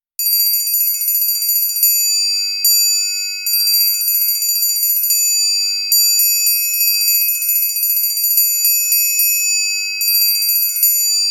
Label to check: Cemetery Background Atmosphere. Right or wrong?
Wrong